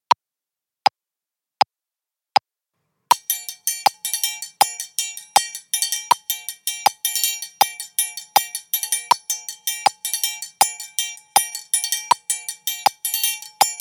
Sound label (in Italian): triangolo